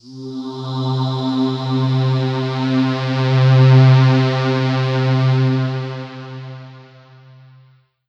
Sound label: Yamaha FS1r Lightyears C3